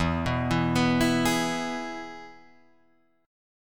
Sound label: E-Major E, Guitar Drop D